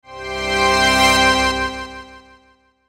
Logo Synth Swell Delay; Sonic Logo; Blastwave

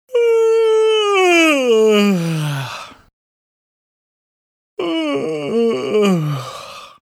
Yawns, Various male yawns; Mouth (Sound Effects:Human)

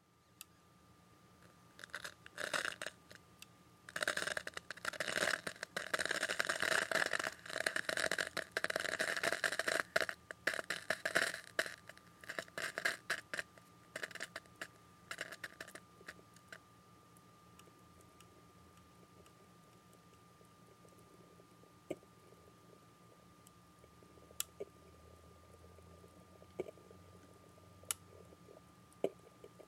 Iron On Heat Up Water Sizzles Subtle; Miscellaneous Household

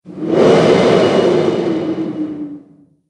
Whoosh Dragon Breath; sound design

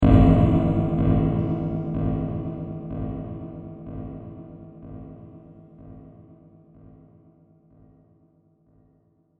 Thud Reverberating Impact; Low Impact Sound Effects

